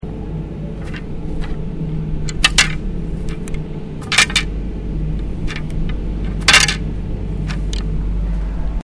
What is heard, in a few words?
Arrows clanking